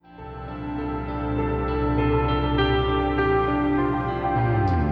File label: Ending Screens Start